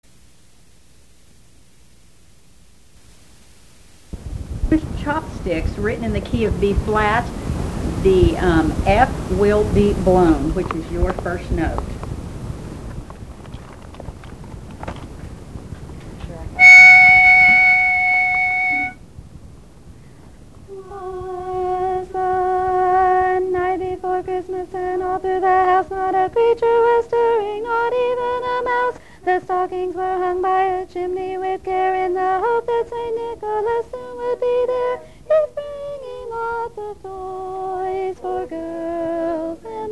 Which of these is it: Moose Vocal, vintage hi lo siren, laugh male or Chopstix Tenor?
Chopstix Tenor